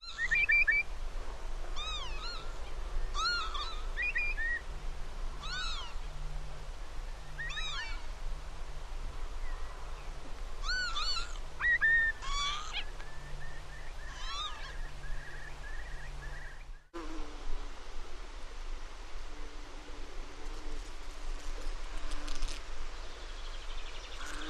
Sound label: grassland sounds